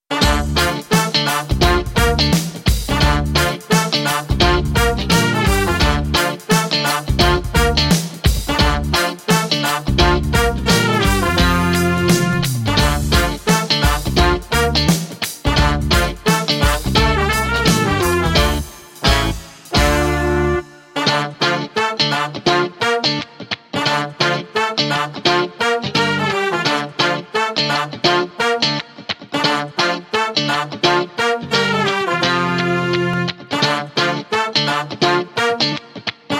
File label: Buradan Yak Trombone